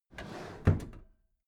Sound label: packed drawer smooth clatter close regular halfway